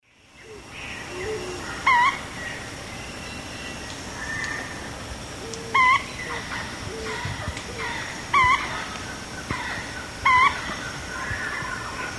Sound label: Celeus grammicus, call; S. America; Eastern Lowlands